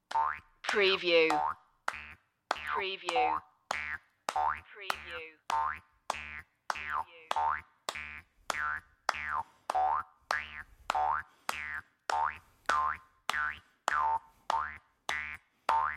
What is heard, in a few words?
stomp loop